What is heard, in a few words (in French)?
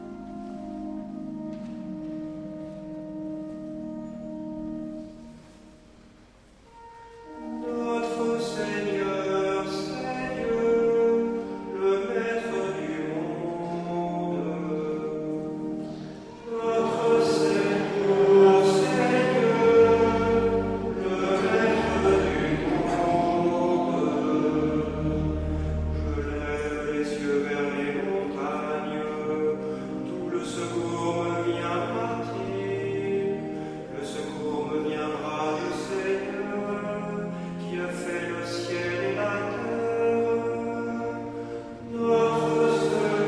Tutti; Psaume 120; Notre Seigneur c est Dieu